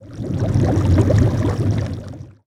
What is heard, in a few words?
Potion Brew; kult